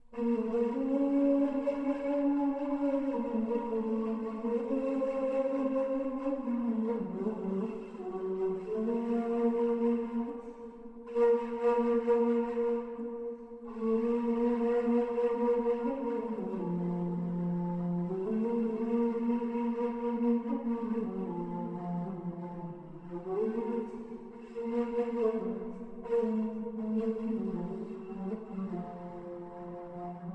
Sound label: ney